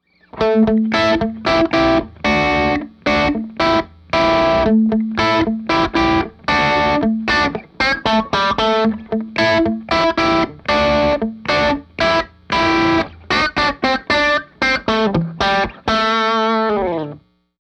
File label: rock rhythm, guitar